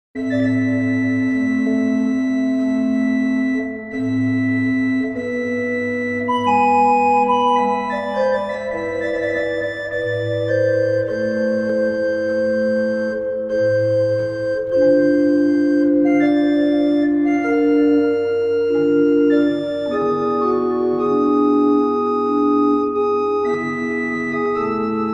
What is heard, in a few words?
adagio reverb, orgue de salon